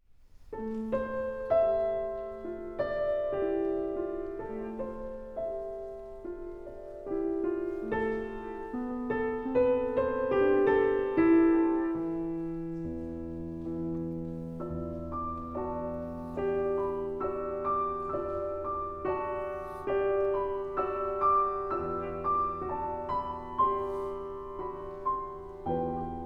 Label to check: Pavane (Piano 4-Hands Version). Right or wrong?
Right